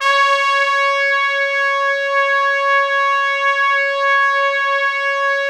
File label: C#4, Orchestral Brass; Best Service ProSamples vol.20; AKAI